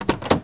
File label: Auto Door Open; Automobiles